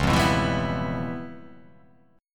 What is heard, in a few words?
C sharp 7th Sharp 9th Flat 5th, chord